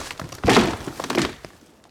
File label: tree fell